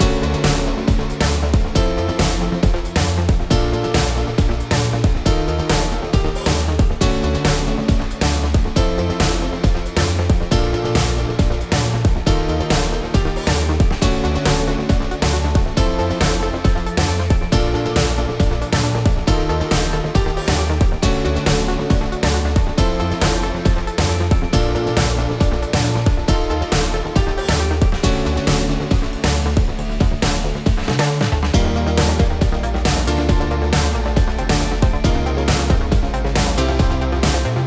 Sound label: battle normal